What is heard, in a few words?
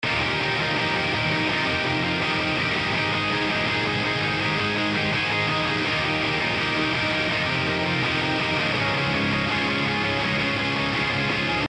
guitar before; puigtech